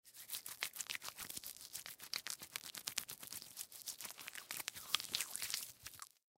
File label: Liquid Soap Lather, Liquid Soap Lather Hands Rub Together; Household; Bathroom; Blastwave